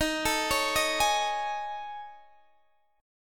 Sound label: A Major 7th Flat 5th